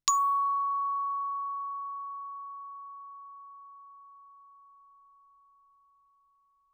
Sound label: bells plastic ff Db6 stereo; Percussion